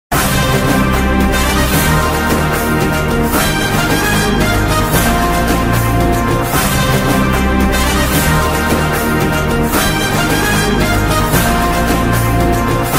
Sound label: bgm ringtone movie bgm; Krack